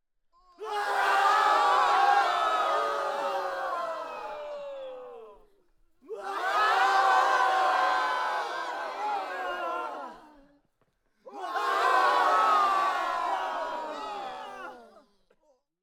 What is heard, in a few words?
EXT GROUP Agony Scream, Battle Crowd; Coll Anderson